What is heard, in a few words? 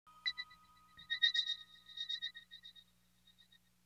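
synth, Hip Hop and R&B Drumloops; Best Service ProSamples vol.01; AKAI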